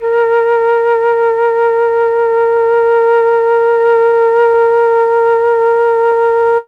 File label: flute; MEL.FLUTE